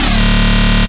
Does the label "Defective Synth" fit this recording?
Yes